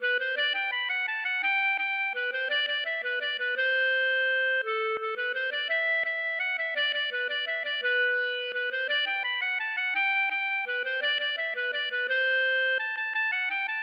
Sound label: mazurka; Mazurka des Seychelles